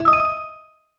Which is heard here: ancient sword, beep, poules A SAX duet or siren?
beep